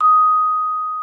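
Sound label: vibraphone D#6; Xsample VOL-4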